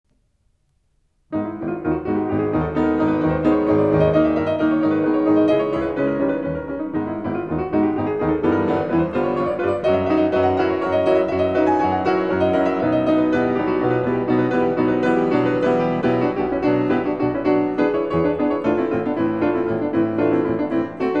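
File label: Preludes, In G Sharp Minor, piano; OP. 28 No. 12; Chopin; Claudio Arrau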